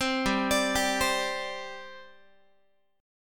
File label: G Suspended 4th G down Guitar; Gsus4 arpeggiated